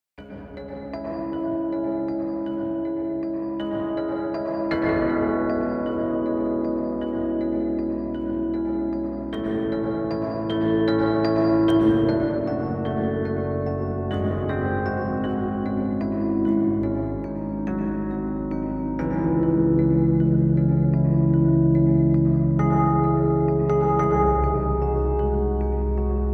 electric 88 piano; waves

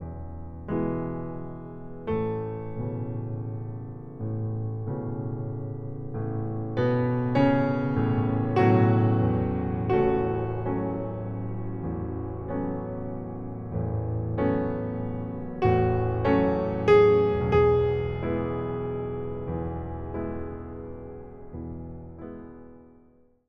pno; pm40

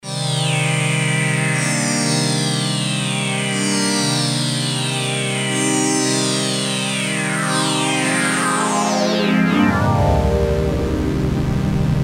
Vintage Sweep; Cypher Preset PD

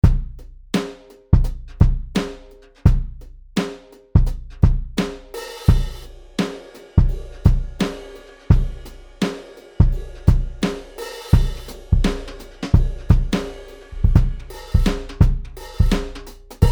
Jazz Club Brushes

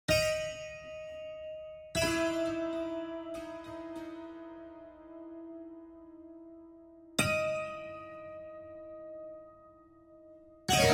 Tap Pluck Toss

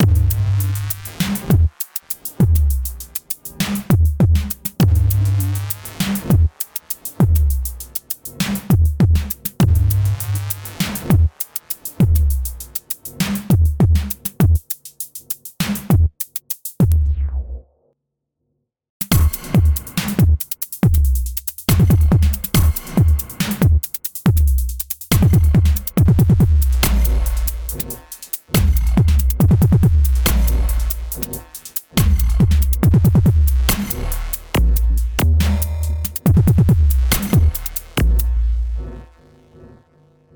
dublike

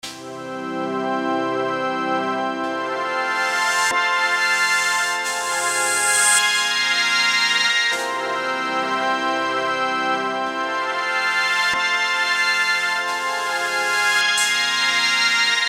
Juno Strings; Amber Preset ST

